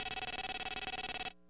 Lp Count

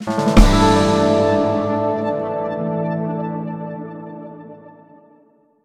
Victory Fanfare Neutral

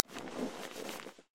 Bed Rustle

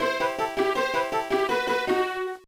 Level Complete; rayman 3 gba gamerip 2003

